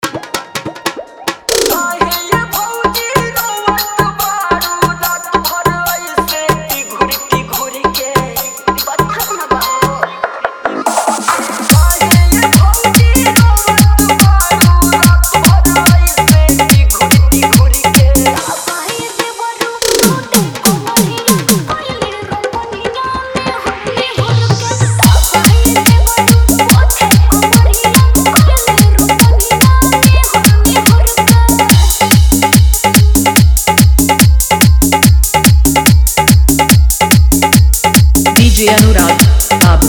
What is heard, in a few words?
Fully Dhollki Bass Mix